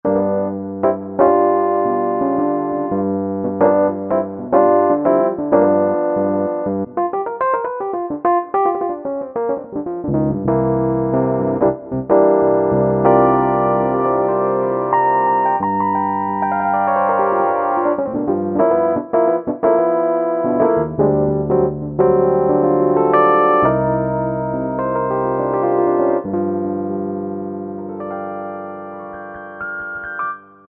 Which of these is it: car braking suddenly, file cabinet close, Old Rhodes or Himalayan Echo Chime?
Old Rhodes